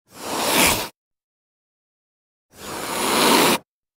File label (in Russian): ocelot, zvuk ocelota